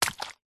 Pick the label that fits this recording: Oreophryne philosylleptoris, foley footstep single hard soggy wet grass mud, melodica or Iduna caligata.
foley footstep single hard soggy wet grass mud